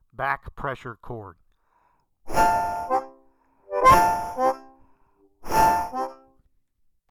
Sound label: Back Pressure Chords